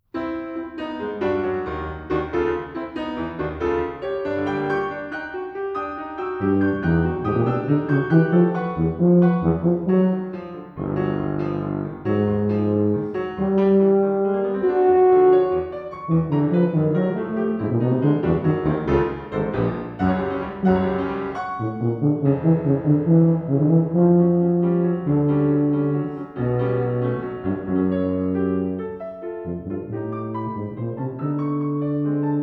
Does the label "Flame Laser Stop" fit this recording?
No